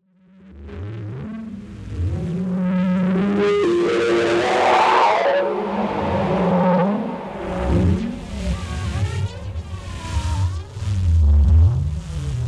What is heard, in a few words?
Interference Field Transmission